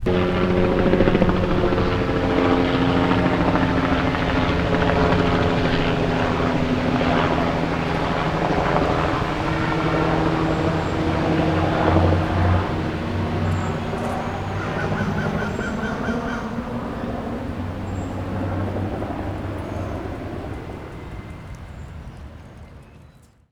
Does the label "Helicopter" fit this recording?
Yes